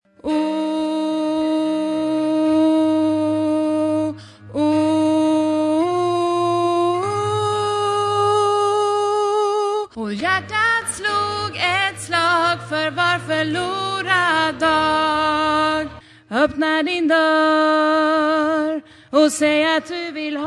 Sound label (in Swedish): alt, stammor